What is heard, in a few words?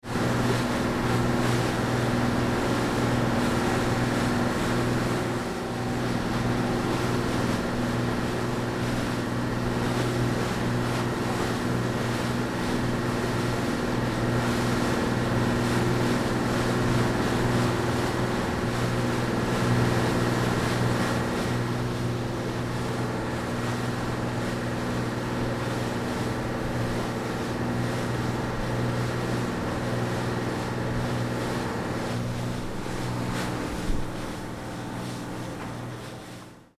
ship engine